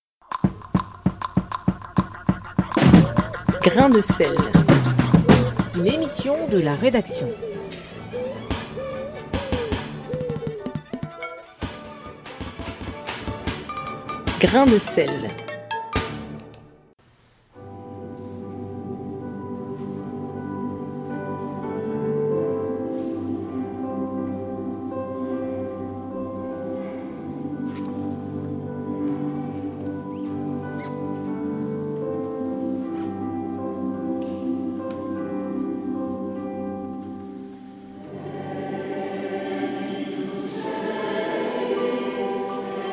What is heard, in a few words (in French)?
la chorale; CLAIRVAL